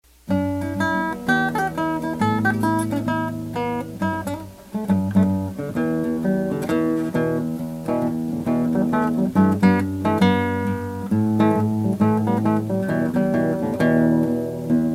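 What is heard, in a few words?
classical guitar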